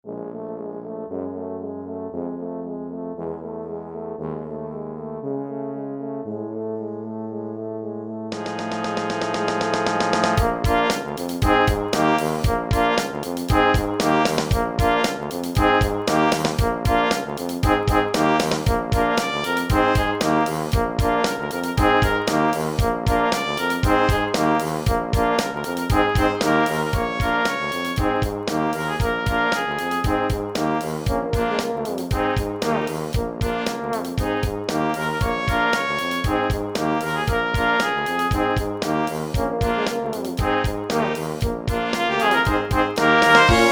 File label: Brass Quintet